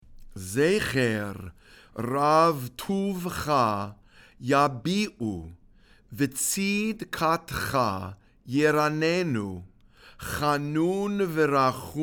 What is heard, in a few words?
ashrei read and sung